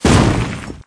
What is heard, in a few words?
giant hit